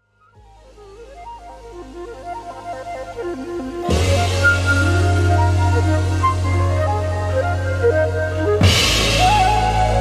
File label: flute